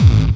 pwom

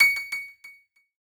key C#

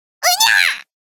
fail sound; osu

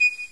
pipe

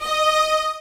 SVF D#5

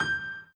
Piano Chord

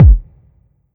drum hit whistle; osu; NieR Automata

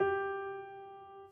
piano sus

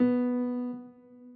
Piano Long B

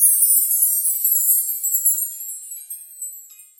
twinkling; osu

